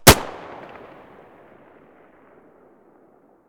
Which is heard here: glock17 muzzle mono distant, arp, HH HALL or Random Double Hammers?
glock17 muzzle mono distant